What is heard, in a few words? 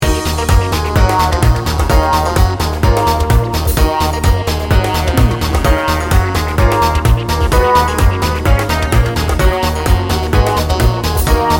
god rest ye merry gentlemen loop